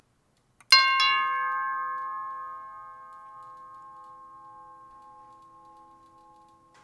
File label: doorbell